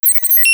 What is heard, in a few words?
blip; Kerbal Space Program; Chatterer; beeps